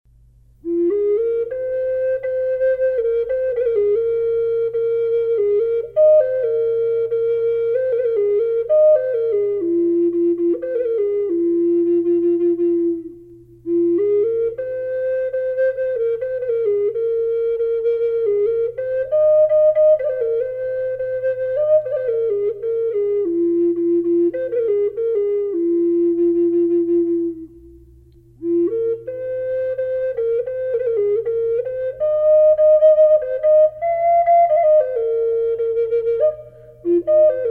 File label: alaskan reverb yellow cedar burl timber wolf, flute; collector flutes